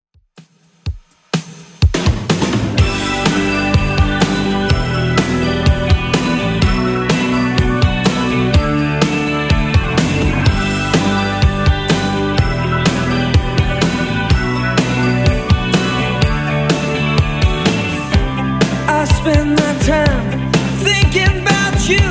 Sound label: 80s 125bpm; Redrum